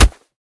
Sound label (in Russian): korotkij zvuk votknutogo shtik nozha